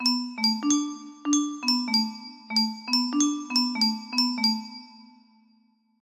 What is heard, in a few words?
music box melody